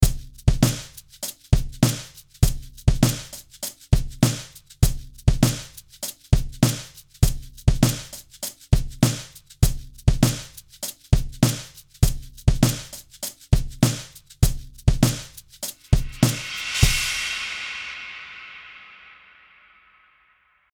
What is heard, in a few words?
Shaker Groove